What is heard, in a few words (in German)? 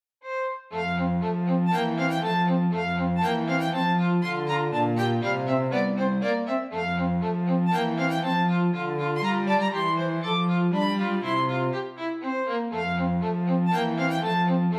Menuette fur zwei violinen und Bas Menuett; sechs Menuette